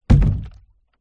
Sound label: industrial cannister small plastic liquid down concrete